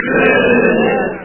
booo

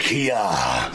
kiyaa

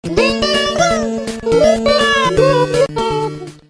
seal

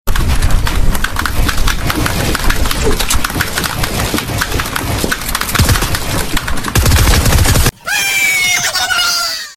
cried; broly

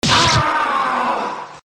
lawnmower hit; item asylum

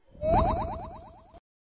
short blobb

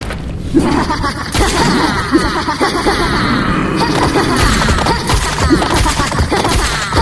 hahahaha; herostorm

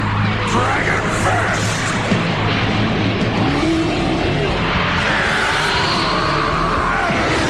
dragon fist

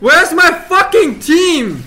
button

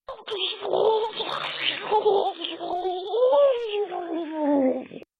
orgasm

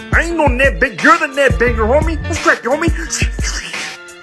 haaaa haaaa

